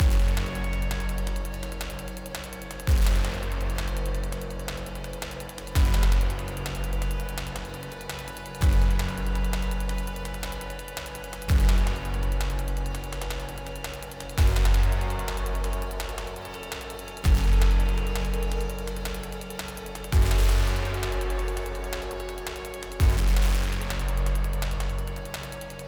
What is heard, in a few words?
Music Tension; Tension Hangar